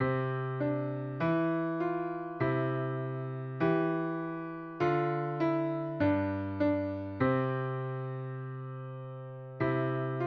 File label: melody, piano; schumann